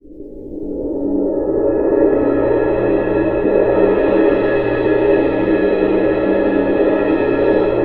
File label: CYM ROL, Percussion; ILIO; Synclavier Percussion 2; World & Orchestral